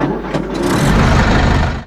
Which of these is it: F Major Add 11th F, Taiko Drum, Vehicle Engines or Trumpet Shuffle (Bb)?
Vehicle Engines